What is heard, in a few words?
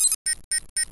lock; Gunlok